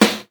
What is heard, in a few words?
juicy snare drum sound a key, Royality free snare drum sound tuned to the A note.; snare-drum-samples